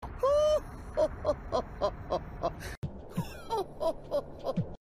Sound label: frieza hohohohoh